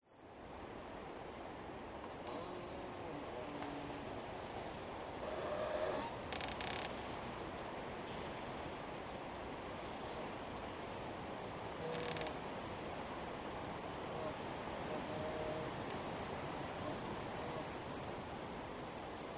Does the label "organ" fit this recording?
No